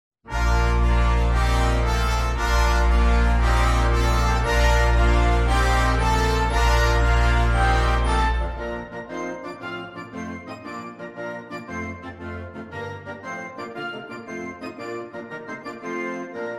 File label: Concert Band